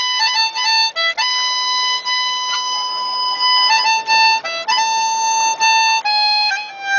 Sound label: Harmonica